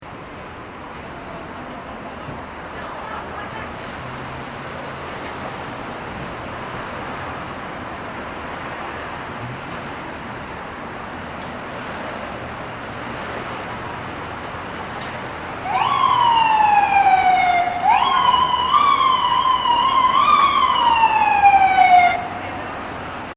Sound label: ambience urban city ambulance siren evening; New York City Street Ambience ( Lower Broadway ) Light Traffic With Very Few Pedestrians; nyc lower broadway; Backgrounds; Playgrounds; McKinney Sound